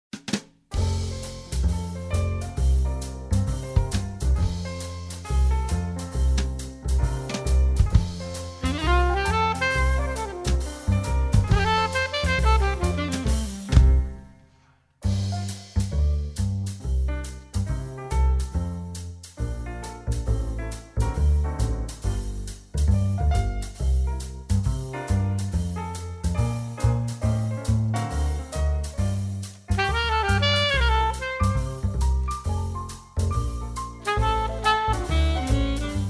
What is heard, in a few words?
F play con sax